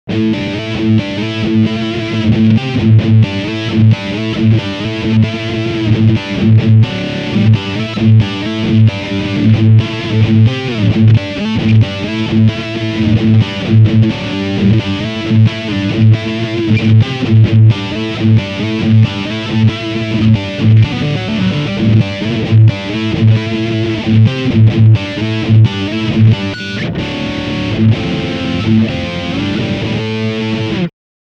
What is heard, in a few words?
ENGL Powerball